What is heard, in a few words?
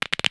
Crack Neck; Civ4 warlords